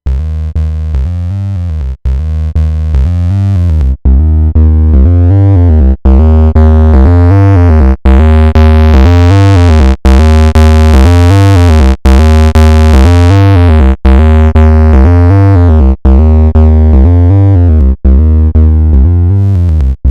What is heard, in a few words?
MFB VCA Drive